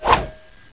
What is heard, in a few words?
knife slash, ninja to; knife v2